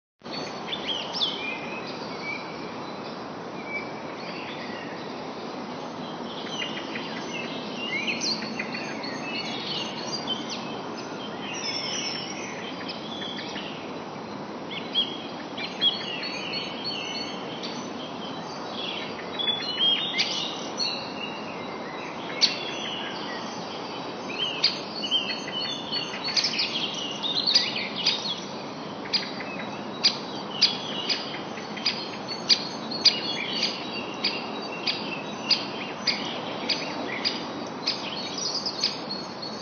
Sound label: blackbird song